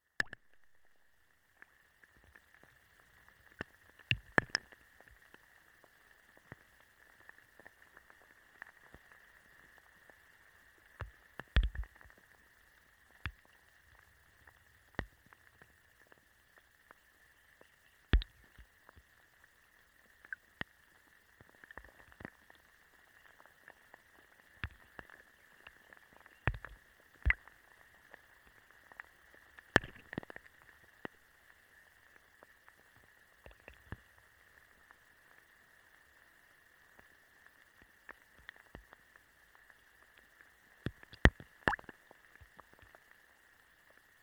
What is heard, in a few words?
Rainstorm Inside a Forest Puddle